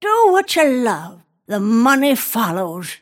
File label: last hit pain; snapfire